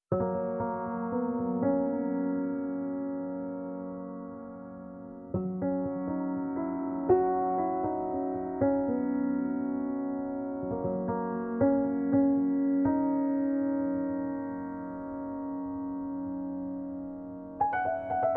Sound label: Underscore Quirky; Narrative Mind